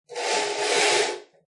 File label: Slide Curtain; Window Sound Effects; Household